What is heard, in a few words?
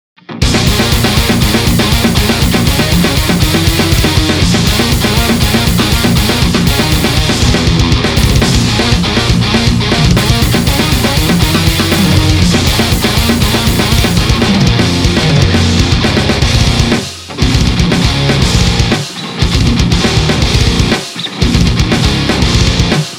6L6 Laney